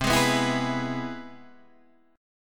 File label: C Augmented Major 7th, Guitar, DADGAD